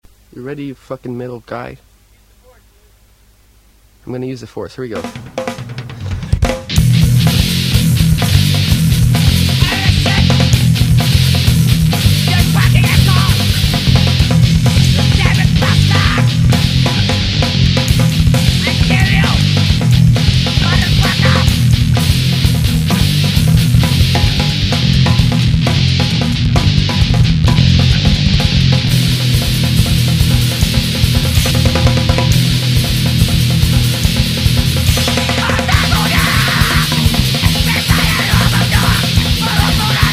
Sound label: hit hop